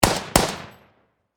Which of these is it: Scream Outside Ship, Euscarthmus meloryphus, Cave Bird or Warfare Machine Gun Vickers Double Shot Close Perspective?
Warfare Machine Gun Vickers Double Shot Close Perspective